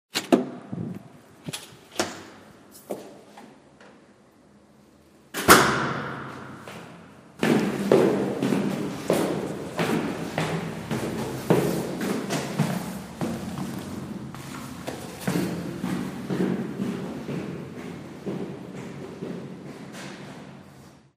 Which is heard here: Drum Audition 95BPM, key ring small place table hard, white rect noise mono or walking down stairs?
walking down stairs